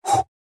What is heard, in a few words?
Punch